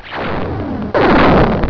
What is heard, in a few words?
explode